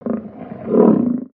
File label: Pet Trike Idle